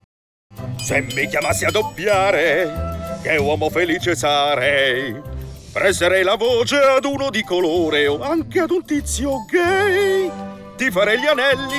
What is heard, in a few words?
Sing